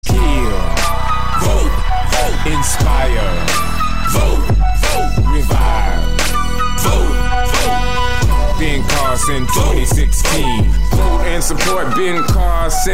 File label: rap; ben carson